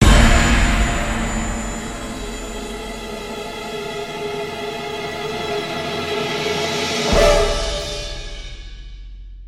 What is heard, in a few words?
music stings tunnel light to dark; LEGO Movie Videogame; Xbox 360